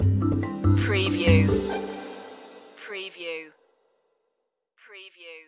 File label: enter out stinger